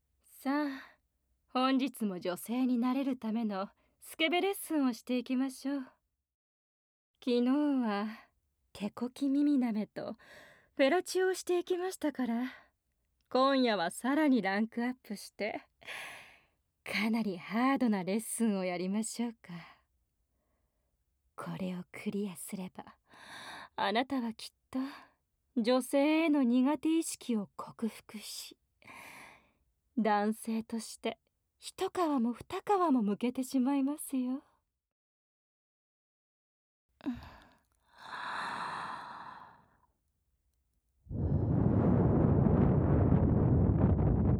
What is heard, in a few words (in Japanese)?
左右同時耳舐めSEX